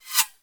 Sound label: bullet pass